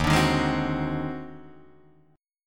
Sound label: D sharp Minor Major 13th, Guitar, DADGAD; strummed